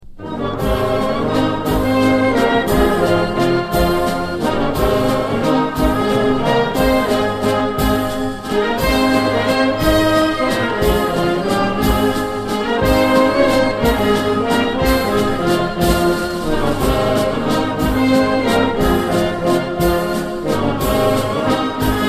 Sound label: Regimental Band Slow March; Duke of Wellingtons; Logie O Buchan 76th